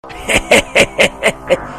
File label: hehehe; Kulfon